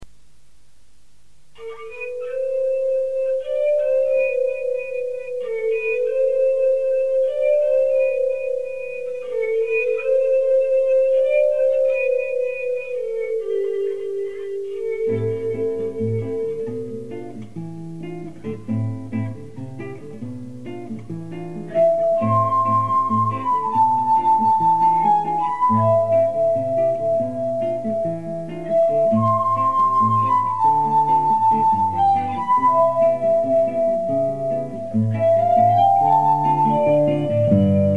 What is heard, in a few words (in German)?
Gitarre; Black Orpheus